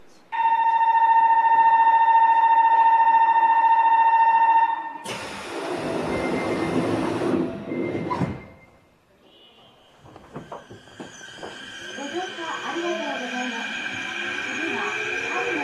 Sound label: kintetu 7021, vvvf mitsubishi